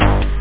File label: bass